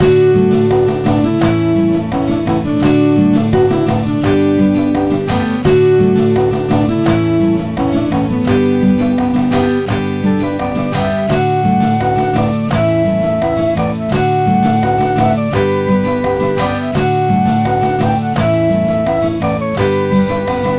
Music Menu; Emma at the Farm; nds

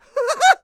laugh success; Ice Age Scrat's Nutty Adventure

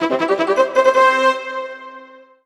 MUSIC EFFECT Orchestral Brass Positive stereo; xbox series xs

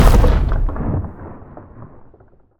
ice hit low; Nut Boarding

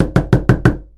Knock

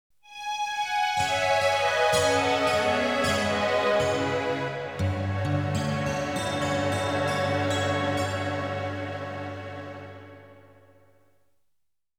Defeat; Eternal Arcadia

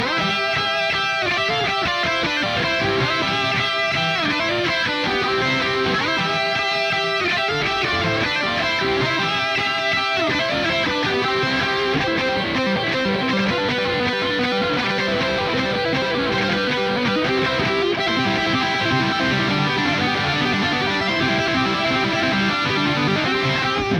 Music Body Guitar; Plants vs. Zombies Battle for Neighborville; GnomeGnight

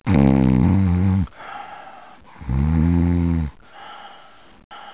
Snore

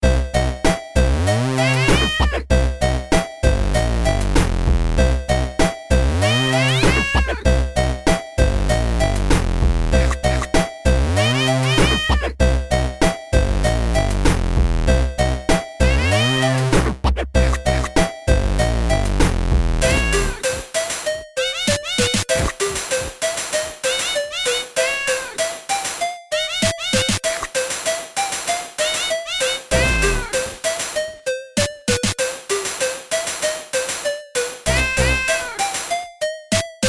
bgm cat hip sfx; Nom Cat; Lucky Kat Studios; Android

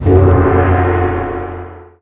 dojo gong; Ninja Avenger Dragon Blade

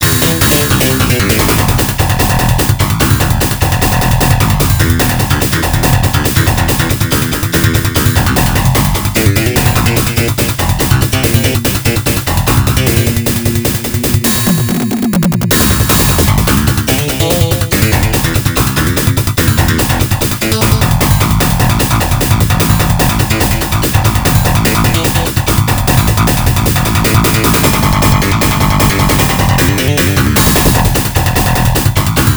Battle Scene (BASS!); Strawberry Daisenryaku; x68000

